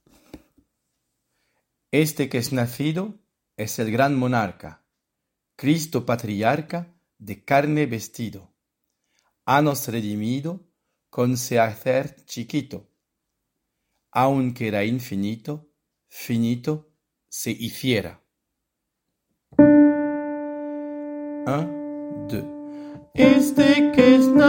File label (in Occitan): riu couplet tenori; la bas dans la prairie